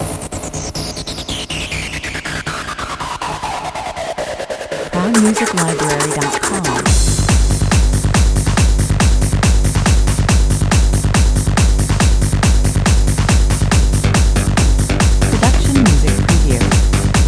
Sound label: hardstyle, 140 bpm